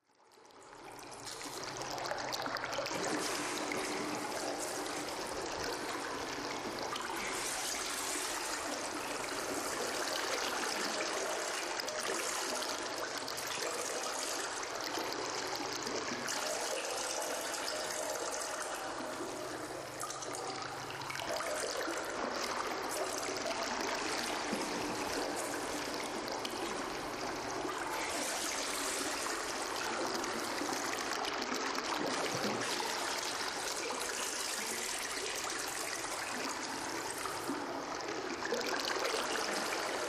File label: Bubbling Hissy Strea; bubbles